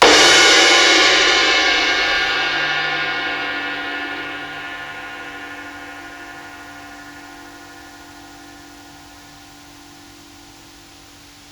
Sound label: Crash, Psychedelic Drums